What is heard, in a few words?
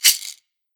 Shaker